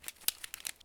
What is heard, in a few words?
plastic generic use; Escape from Tarkov